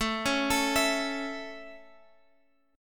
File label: A Major, Guitar, Open G